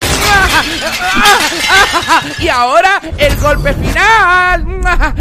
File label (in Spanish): golpe final